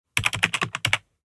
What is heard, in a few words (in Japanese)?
キーボード タイピング音